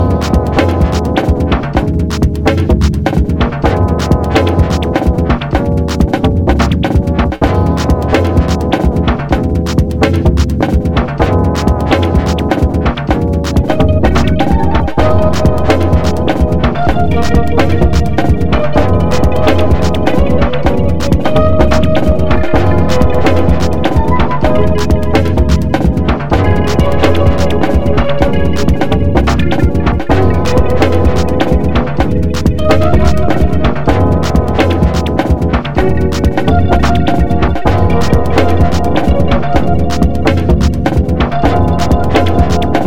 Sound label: soft loop